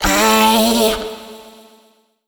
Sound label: Vocal one shot A; Slate Digital Omnivox Vocal Sample Pack